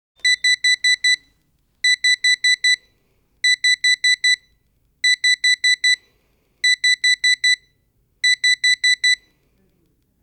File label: wireless call; densetsu; signal